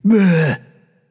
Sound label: nv tongue; smite; voicepack; hachiman